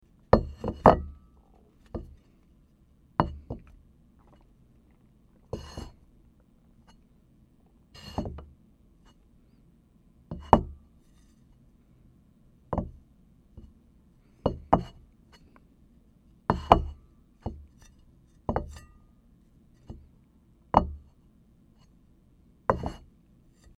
dish place

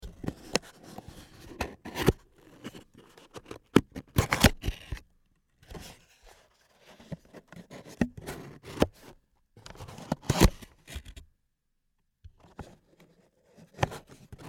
paper lid

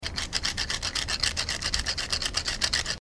pepper mill

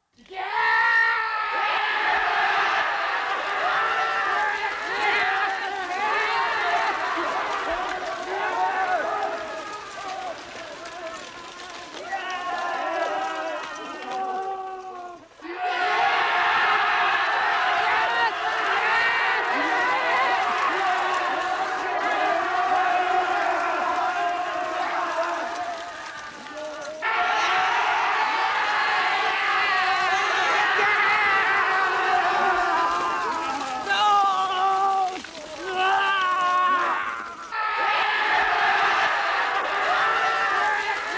japan war-cry

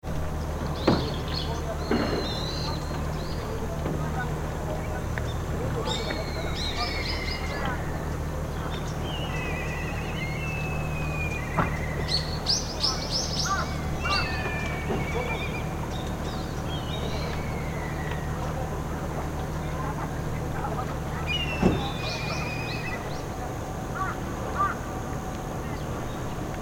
harbor

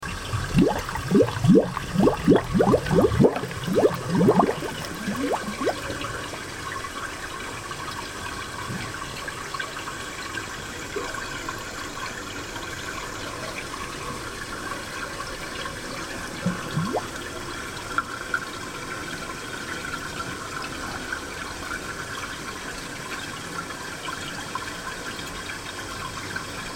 spring

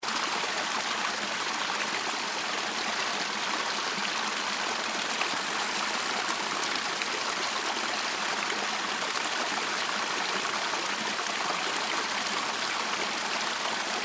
Tsuzura Tanada; Ukiha

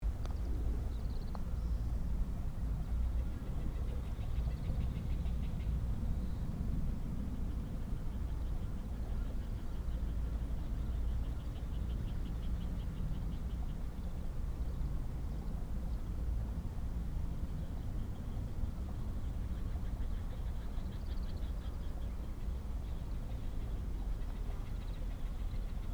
Kanatake Park

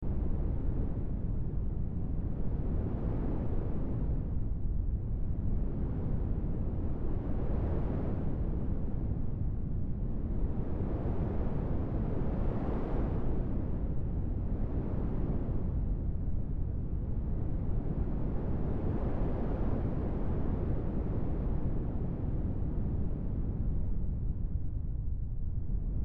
wind

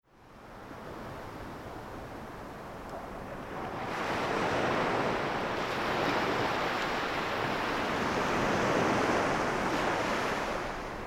wave

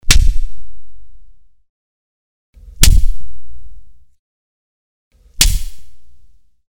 face slapping

